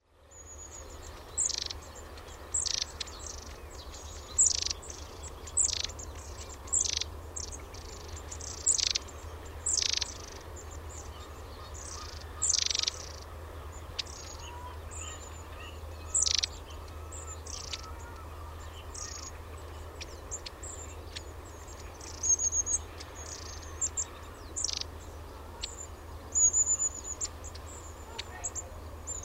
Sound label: long tailed tit